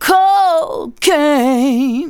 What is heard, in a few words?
vox, Techno Trance; Ueberschall